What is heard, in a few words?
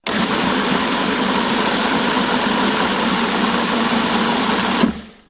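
Food Processor Start; appliances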